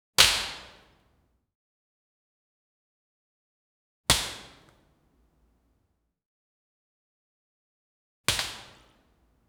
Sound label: ceiling open fruit droppi; interaction